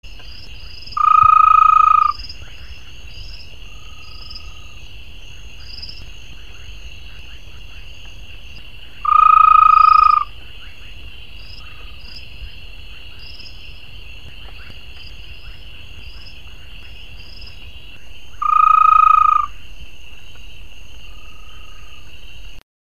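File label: Phrynomantis microps, frog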